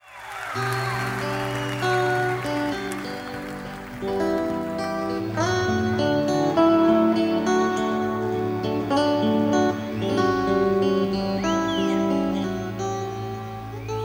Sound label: Schecter strat red, guitar